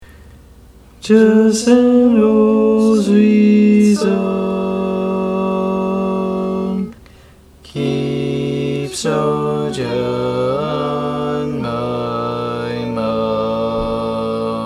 Bass, barbershop tag

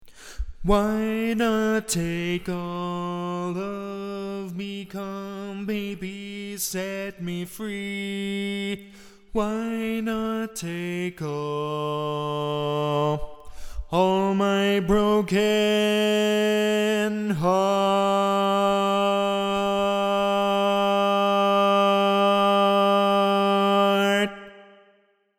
Bari, barbershop tags

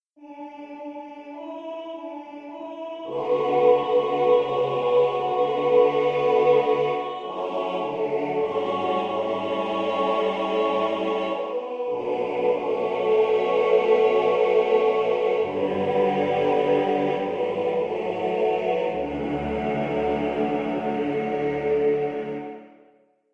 All Parts, barbershop tag

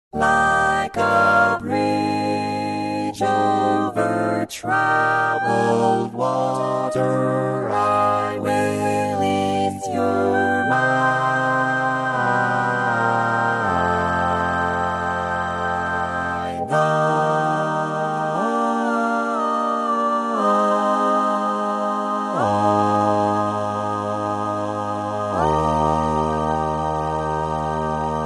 Bass, barbershop

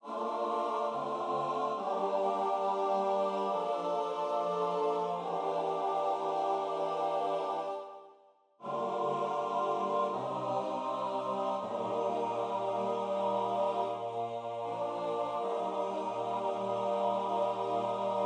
All Parts, barbershop tags

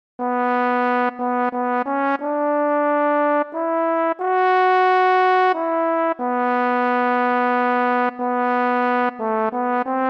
Lead, barbershop

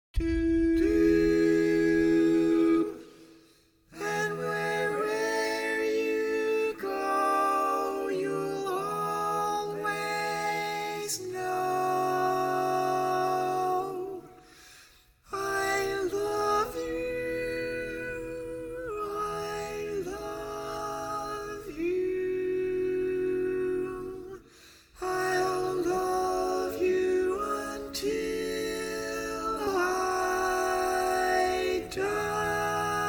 Tenor, barbershop

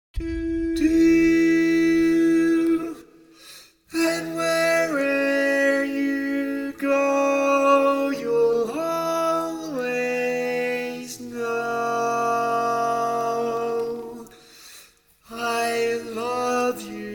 Bari, barbershop tags

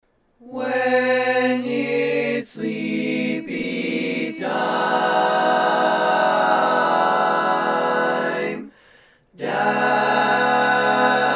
8 Parts, All Parts

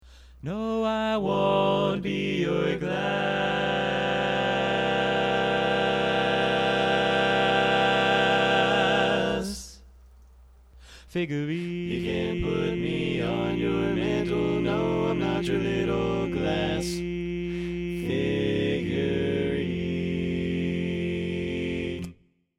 All Parts, barbershop tag

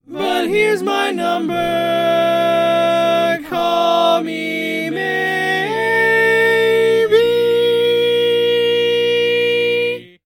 Tenor, barbershop

